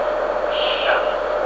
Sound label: shhhh